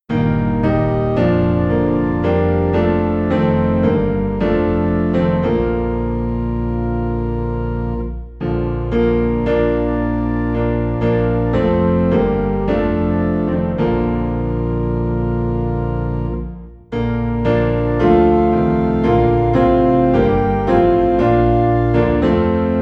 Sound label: Piano and Organ Low in B Flat